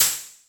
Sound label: Open Hat, Yamaha FS1R